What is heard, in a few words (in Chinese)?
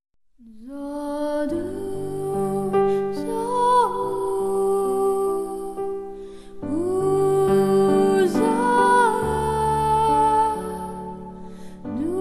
伤感的背景音乐